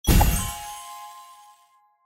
symbol win elephant; Fondant Favourites StarTrail; Eyecon

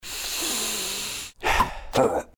intro sfx; without music

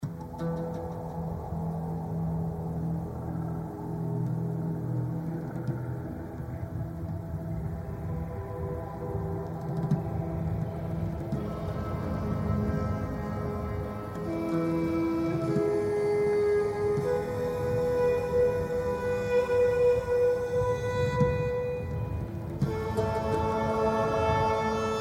general Music layer; Champions of Valhalla

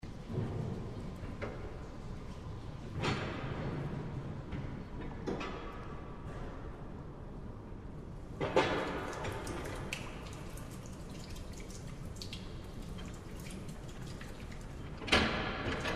bg Music; Dynamite Digger Eureka

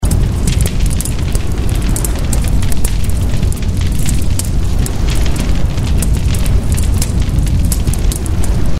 windup continue; tiki-beats; eyecon

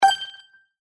line win; Hot Off The Press; Eyecon

